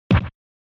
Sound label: SNES Soccer Shoot